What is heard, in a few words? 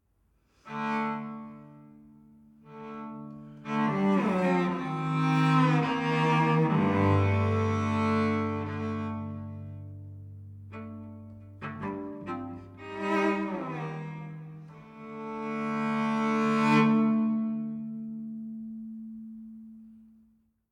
Savannah Music